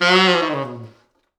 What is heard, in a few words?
T Sax falls, Horns Sax